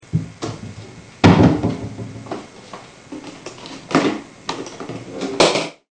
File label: CABINETS